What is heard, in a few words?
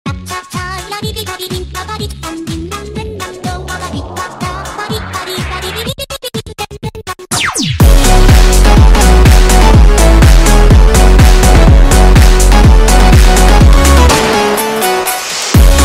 Phonk Remix